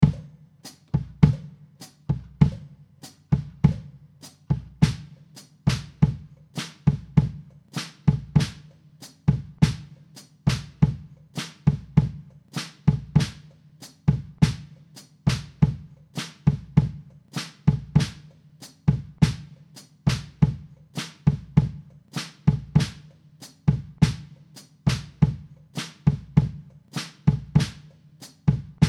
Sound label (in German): drei zwei son clave